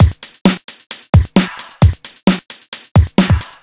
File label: 132 No Fx, Drum Loops; Zero-G; Soundclash Nu Dance Electro; Acidized Wavs; 132bpm; Bump n Groove